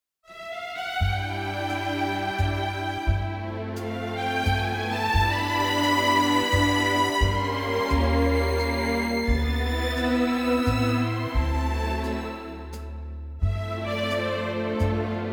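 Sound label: C 3/4 orchestral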